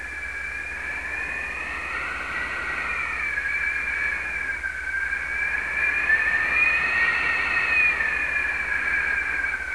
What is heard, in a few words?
FALL; My Animated Word Book; season